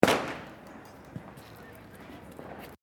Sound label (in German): Silvester Knaller